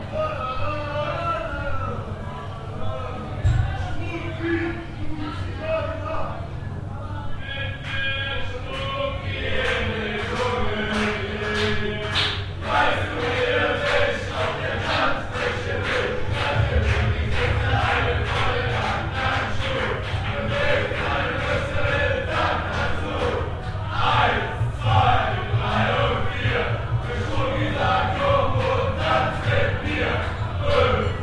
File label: german neighbors party